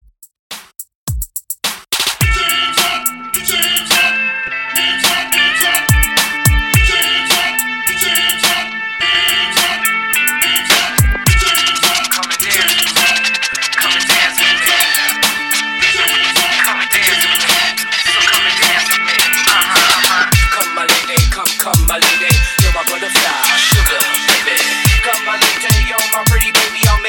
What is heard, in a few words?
Oldschool Hype Intro 106 BPM